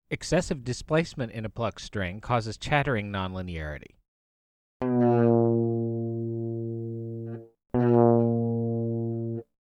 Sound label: Chattering Guitar Nonlinearity